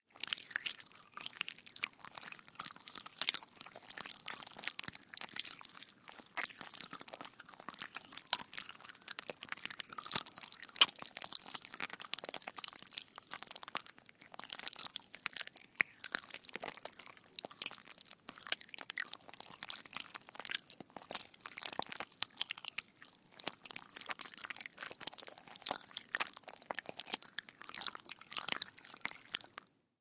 zvuki cherviakov

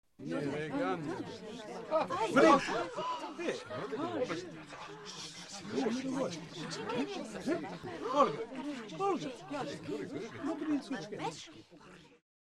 zvuki nepriiatnogo shoka u cheloveka

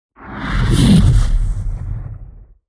zvuki meteorita asteroida

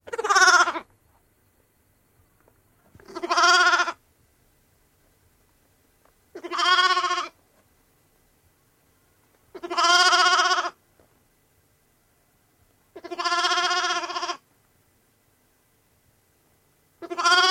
zvuki iagnenka

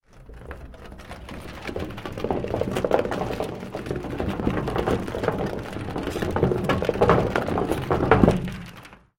zvuki bochki

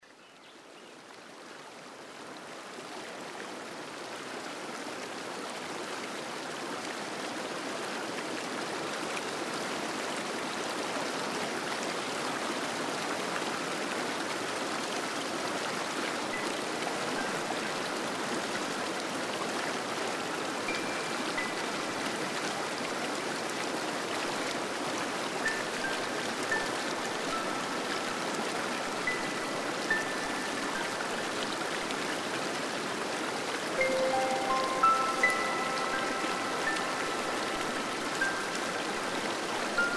zvuki fontana

